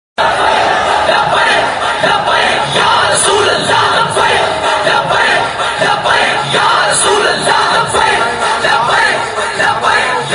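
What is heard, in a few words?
arabic ringtone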